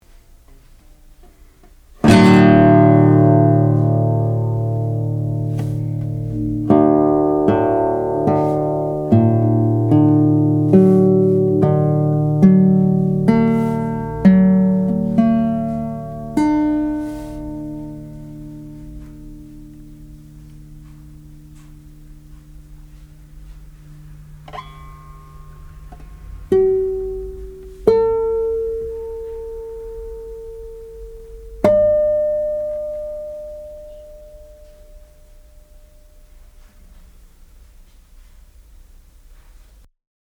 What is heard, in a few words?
in front 60 cm